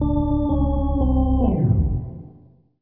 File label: lose, stadium; hockey95